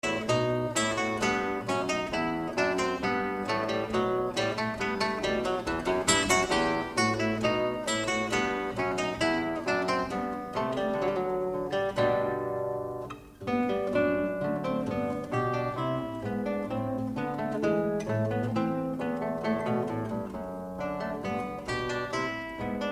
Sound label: Lute Suite, Bourrée, Guitar; Lute Suite No. 1 in E Minor, BWV 996